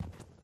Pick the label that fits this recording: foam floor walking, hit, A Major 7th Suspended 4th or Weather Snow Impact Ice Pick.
foam floor walking